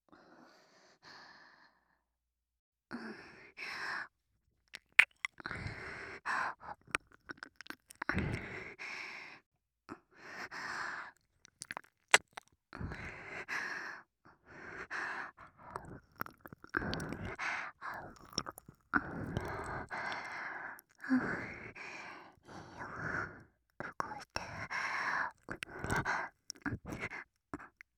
喘ぎ 耳舐め喘ぎ

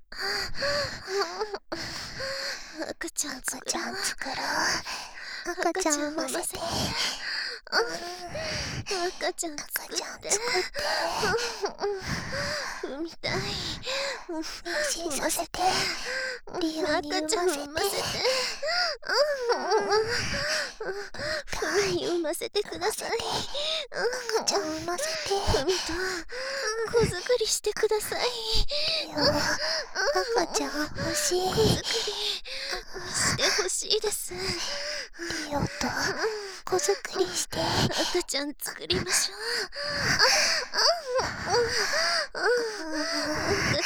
喘ぎ, バイノーラル; 赤ちゃん作ろ♪; ハーレムオナサポ 4人のメイドがご主人様のオナニーをお手伝いするバイノーラル～文、千曜、名月、莉桜～

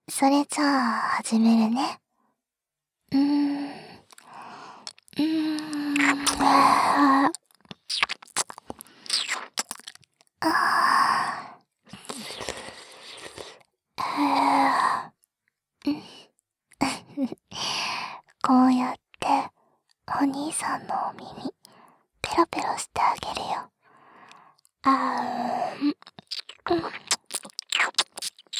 耳舐め, ASMR; 効果音なし; すばるの耳舐め

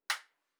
スイッチを切る

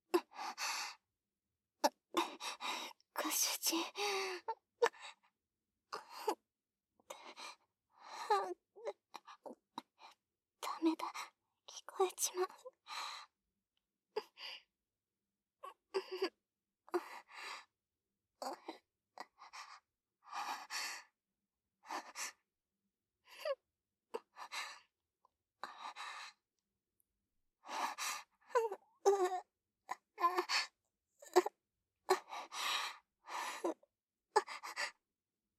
口奉仕 声のみ; メイドさん; ますずさんと交際

